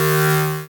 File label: SYN R4TH, dance synth; Sound & Vision - Gigapack I CD 2 (Roland)